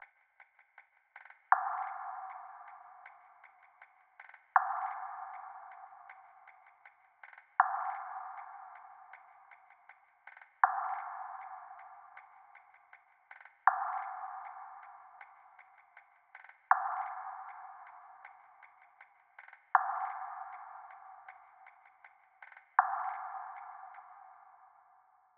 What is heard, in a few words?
158 BPM B Min Percussion; Melody; RnB; Loop Stems; Cymatics; 2022 Melody Collection; Without U